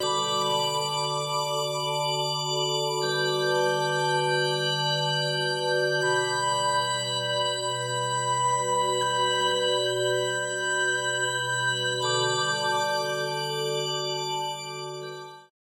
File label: pad crystal reverie